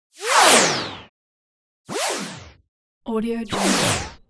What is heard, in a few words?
electricity electronics charge